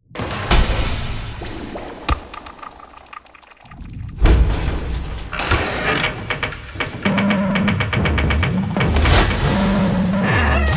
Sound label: fantasy dark dungeon weird glitchy abstract; Audio Mangler